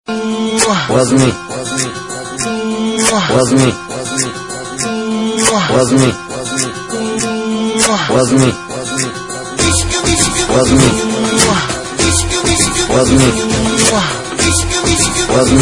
Dj Remixed

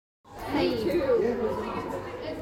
Sausage rap

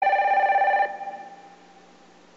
80s Digital Ringer

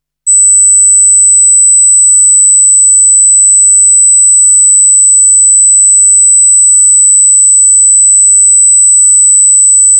High Frequency

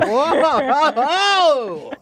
Woahohoho; H3H3

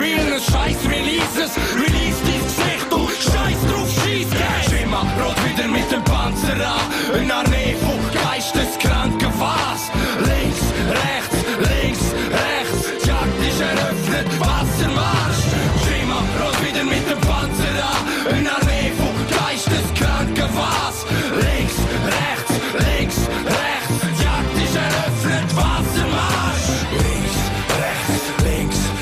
Swiss Rap

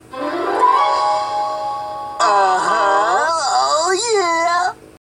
uh huh oh yeah

